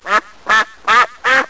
Kacica; Mesto a dvor; Revelation Sight and Sound SK

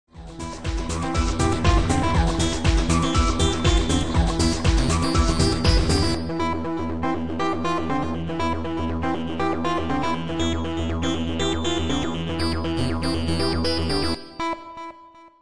Hudba; Revelation Sight and Sound SK

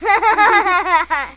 Smiech; Revelation Sight and Sound SK

